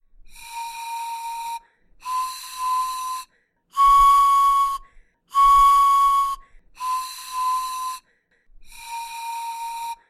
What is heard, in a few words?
Scale